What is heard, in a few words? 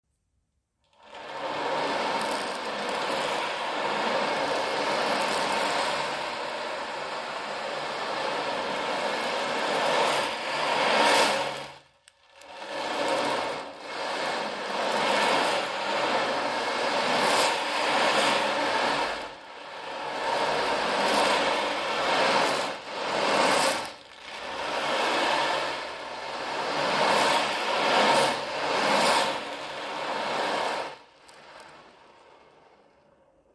ocean